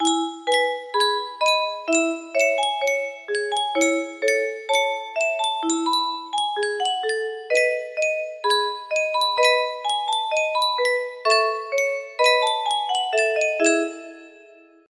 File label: music box